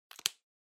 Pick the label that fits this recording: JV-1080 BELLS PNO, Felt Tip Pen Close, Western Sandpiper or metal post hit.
Felt Tip Pen Close